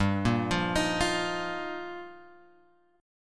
G Minor 7th Sharp 5th; Gm7#5 Chord {3 1 3 X 4 1} arpeggiated

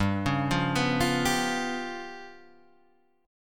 G 7th Flat 5th G down, chord; arpeggiated; JGuitar

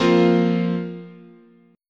F Suspended 4th, chord

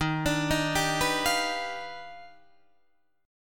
Eb Augmented Major 9th Eb down Guitar Open D

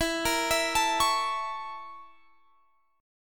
A sharp Minor Major 7th Flat 5th

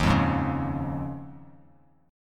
Eb 7th Suspended 2nd Suspended 4th, Bass Standard; 11,8,6,6; Eb7sus2sus4 strummed; JGuitar

